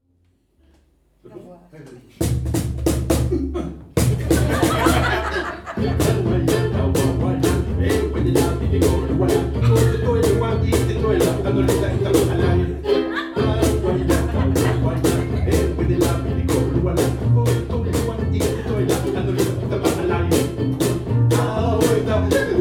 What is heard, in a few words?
Hawaiian war chant, ukulele